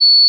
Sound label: D flat 4, pure tones